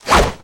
Pickup Misc Swish; Pickups

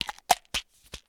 Medicine Bottle Close; Medical; Blastwave

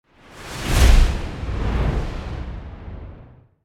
Whoosh Fat Storm; Hollywood Edge; sound designer's toolkit v2

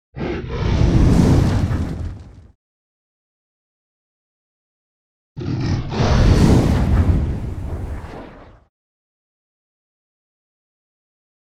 Dragon Roars And Breaths Fire; Sound Ideas; Series 2000

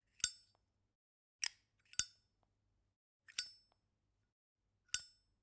Generic Click Metal Tiny

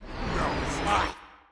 Punch Right Speed Up; Pitch Black - A Detective's Demise

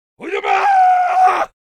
cartoon yell; NCH Library

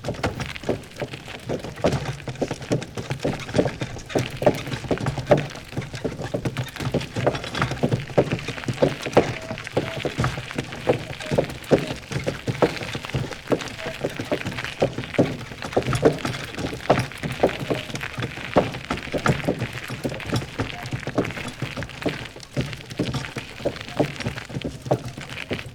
carreton movement; DeadFright

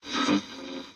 radio static in; Blind Drive

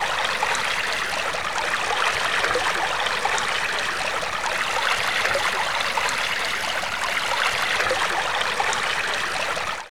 water stream medium; best service Blue Box; water streams

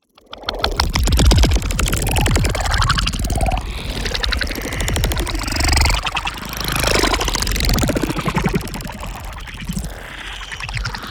NEON TEXTURE Mutated Virus; boom library

